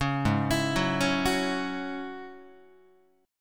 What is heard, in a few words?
Ab 7th Suspended 4th Sharp 5th Ab down Guitar; Ab7sus4#5 arpeggiated; 4,4,7,4,5,5